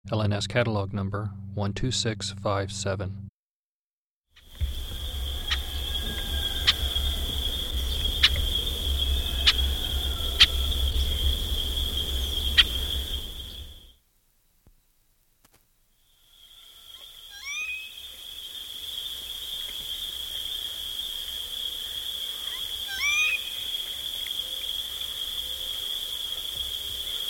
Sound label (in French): chant